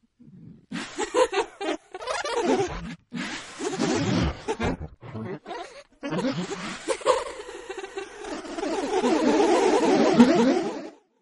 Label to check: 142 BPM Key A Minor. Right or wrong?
Wrong